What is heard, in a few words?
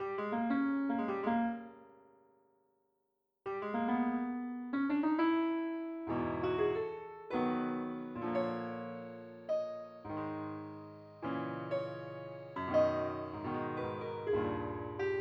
piano